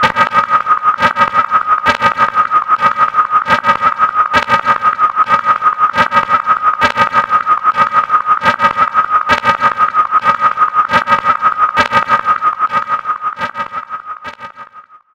Fogbank D#, Propeller Drones; Zero-G Seismic Frequencies

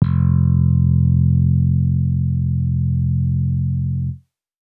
Dusty Analog Bass F, Bass Guitar

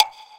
Wood, Block Stick; Equipped Music; Loungin House

